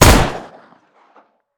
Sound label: rev